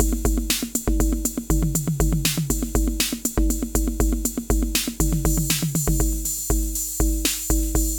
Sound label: 606 Beat, 120, Drum Machines; 606 Strangeway; Ableton